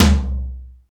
large room tom drum single hit c key, Royality free tom tuned to the C note.